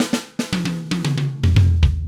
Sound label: Acoustic Drumloop